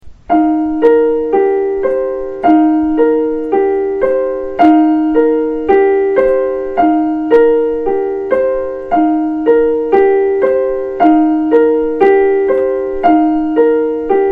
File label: Ostinato; Peace